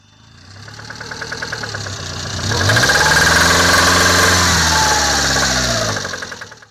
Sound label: Thrown Connecting Rods